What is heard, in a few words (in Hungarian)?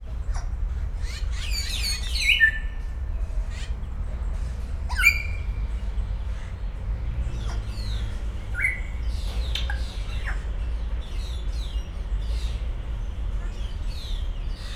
érdekes hangot ad, jákópapagáj; szegedi zoo 2011